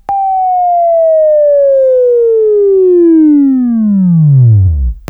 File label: WAV Left, Sweeping; Sweeping Training program